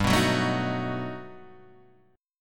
G Augmented Major 9th, Guitar, Half Step Down

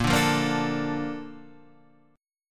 A sharp Major 7th, Guitar Half Step Down

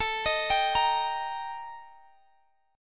Eb Major A; arpeggiated; JGuitar

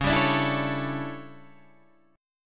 D Major 7th Suspended 2nd Suspended 4th

